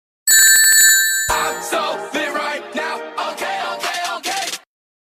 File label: ring drop